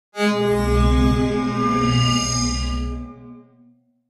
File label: Feedback HF Buzz